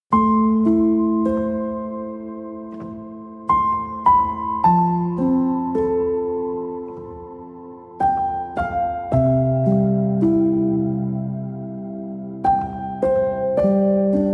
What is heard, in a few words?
strings version; meditation